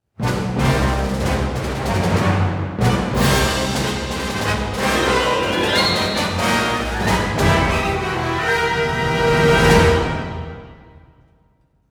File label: Battles Imperial Win; Hoth